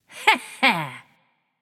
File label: "heh heh"; smite; voicepack; nike